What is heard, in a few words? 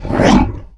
Orc attack; SFXEnemies; Dungeon Defender; DunDef